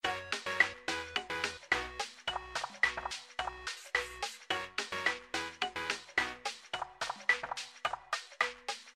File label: Paytable BGM; slots; Robot Vs Monster